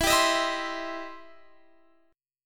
E Minor Major 7th Sharp 5th, Guitar, Drop D; x,19,17,20,17,20; down

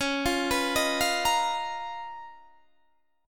C sharp 13th C sharp, Guitar, Standard; arpeggiated; C#13; JGuitar

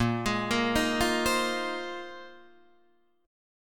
Bb 9th Suspended 4th Bb down Guitar DADGAD; arpeggiated

